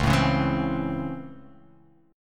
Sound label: C sharp Minor Major 7th Sharp 5th C sharp, chord